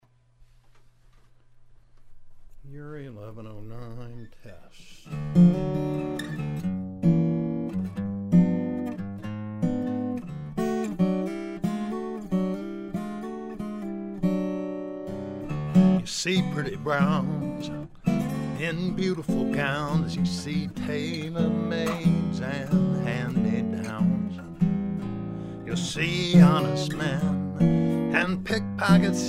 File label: Urei 1109, Neumann KM84 at 12th fret and 3″ from lower bout. Neumann U87 on vocal.; Langevin AM-16 2U